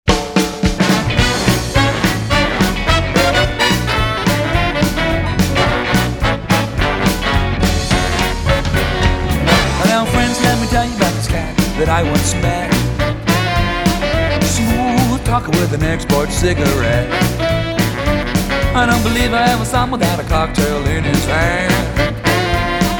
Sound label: jazz